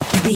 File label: footstep snow slide